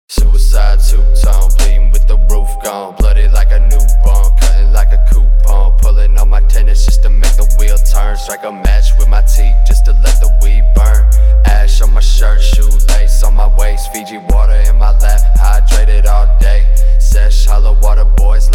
37-41Hz Low Bass

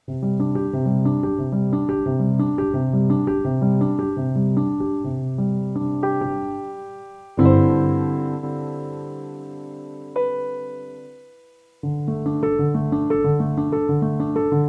Piano

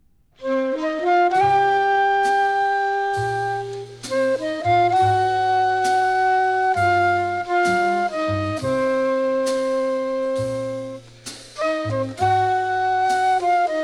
Orchestra, Blues

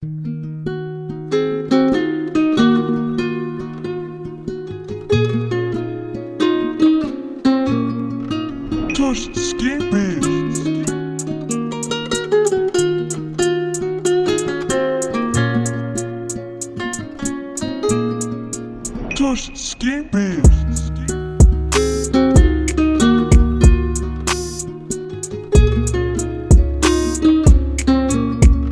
RAIN 94 BPM

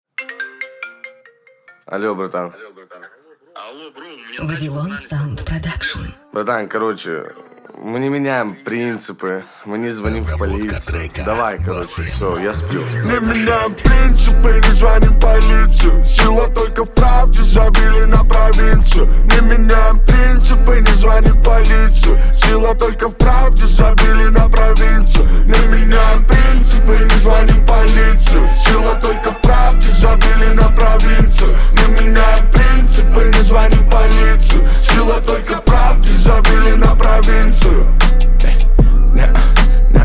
31-35hz low by bass

